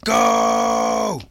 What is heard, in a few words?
hero move